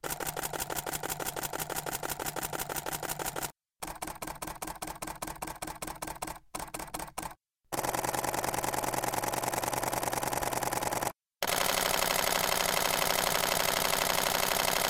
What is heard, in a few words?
Canon EOS 5DIII 5Ds 7DII 1DX Burst